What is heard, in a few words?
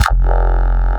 Reese Bass F; Antidote Advent